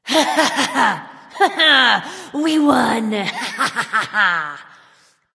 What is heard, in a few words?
"yay"; smite; voicepack; nuwa